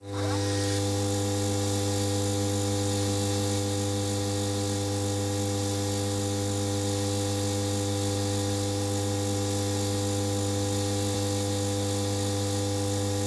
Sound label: Delivery Drone Movement; Going Under (Switch) (gamerip) (2020)